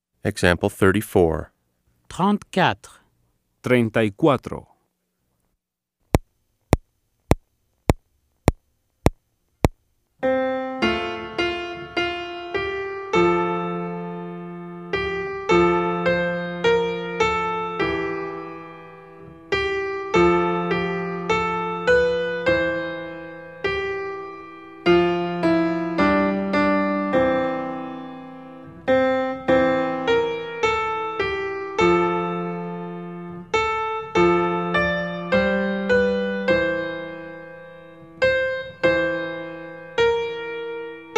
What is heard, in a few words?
Keyboard